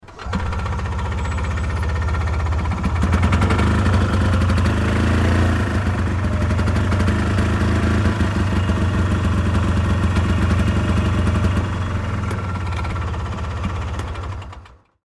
Golf Cart Turn On, Golf Cart Turn On Turn Off Short Drive; Sports; Blastwave